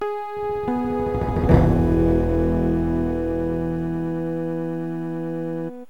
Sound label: tympling A; WebTV; ROM Music